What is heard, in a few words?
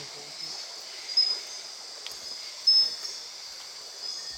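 Hyalinobatrachium mondolfii, frog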